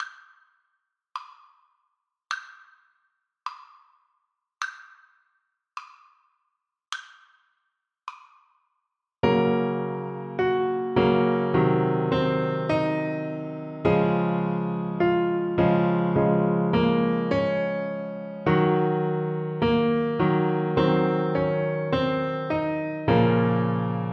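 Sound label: borodin polovtsian, alto saxophone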